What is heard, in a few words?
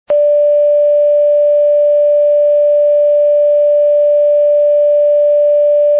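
D natural 2, recorders; tuning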